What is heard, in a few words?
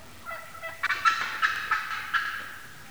fly down cackle, Turkey Sounds